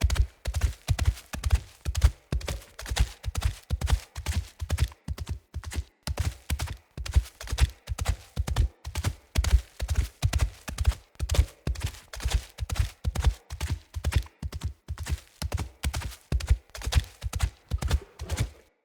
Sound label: Wolf Steps Run Loop; Animals; Footsteps